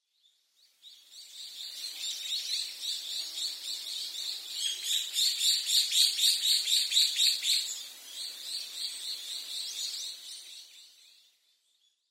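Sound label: Taiwan bulbul, yonaguni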